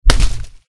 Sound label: impact blunt